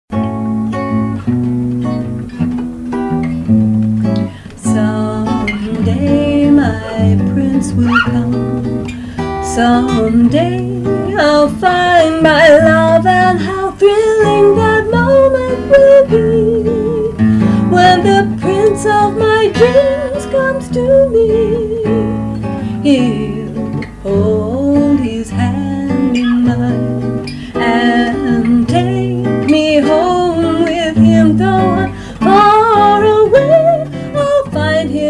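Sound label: vocals & guitar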